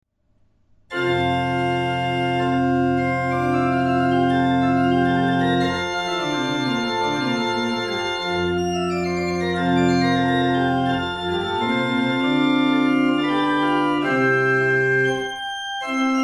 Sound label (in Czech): ukazky positiv; hudba